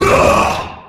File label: Attack Voice